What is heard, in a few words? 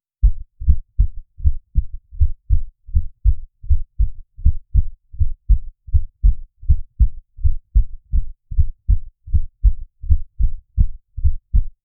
create a sound effect fea, heartbeat